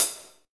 HH, Drum Mashines; EdgeSounds

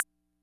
Ace Tone Rhythm Fever Perc, Drum Machines; 300 Drum Machines

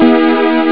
st chord; Amiga Soundtracker Sample Packs

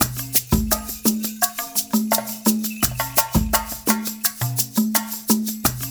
loop, percussion

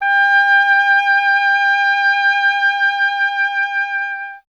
WND OBOE, Solo Instruments; Miroslav Vitous - Solo Instruments VOL-2

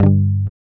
GUI G, Acoustic

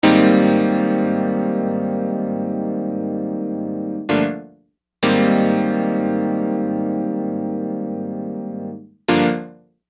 Acoustic Piano; Construction Kit; HIP-HOP; Sonic Foundry (Sony Creative Software)

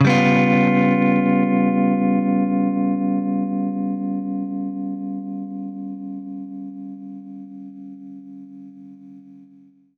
Baritone Chord G7b5, Guitar Chords; undercover samples

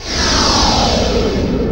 NOISE, Production Effects

